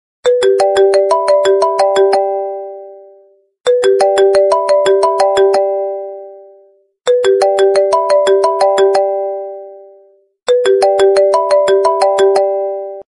Original iPhone Ringtone

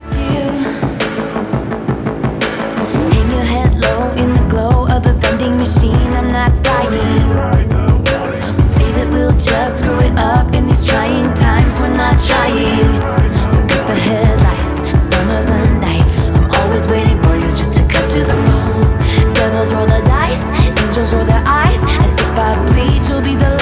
Ringtone

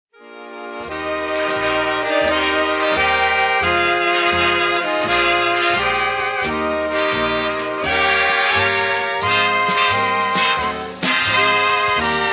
New Years Song Ringtone

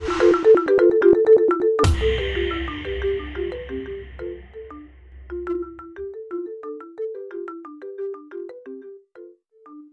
Remix iPhone Tone

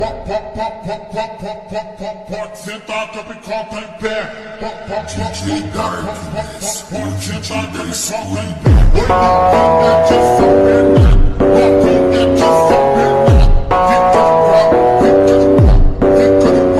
Cool Ringtone

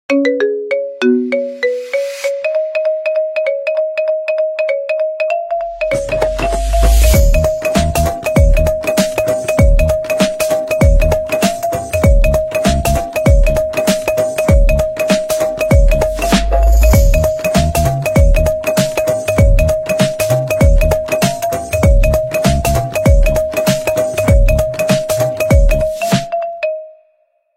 iPhone Remix Ringtone

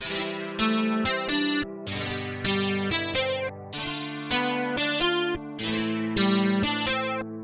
Guitar Ringtone

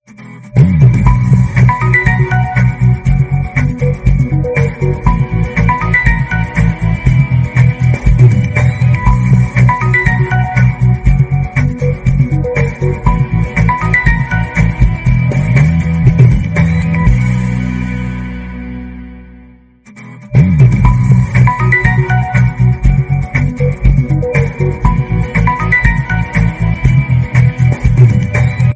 Samsung A73 5g Ringtone; Device : Samsung; Model : Samsung A73 5G

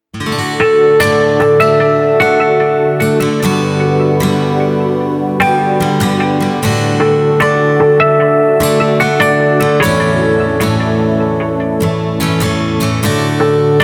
Oppo F19 Ringtone; Device : Oppo; Model : Oppo F19

